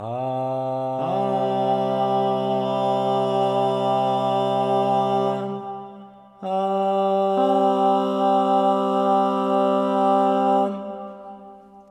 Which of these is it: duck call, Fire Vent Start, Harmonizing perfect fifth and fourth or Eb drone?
Harmonizing perfect fifth and fourth